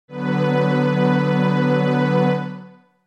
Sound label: F# B Minor